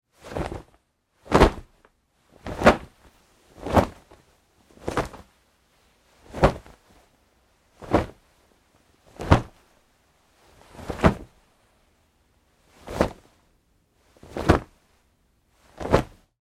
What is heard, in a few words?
Foley Fight Moves Jeans Singles Med; Wrestling and Struggling; Big Room Sound